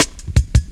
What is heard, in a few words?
Tin Cut, Jungle, Drum Loops 165.5; Zero-G Jungle Warfare 1, 2 & 3